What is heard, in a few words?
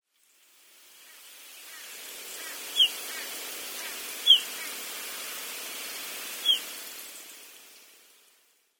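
kuronobitaki, Pied Bush Chat; akune; koe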